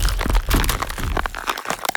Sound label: ice melt; freeze